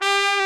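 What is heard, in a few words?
brass bright trumpet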